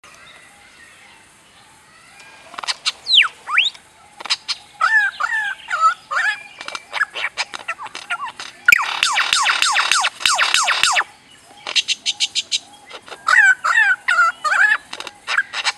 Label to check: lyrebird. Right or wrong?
Right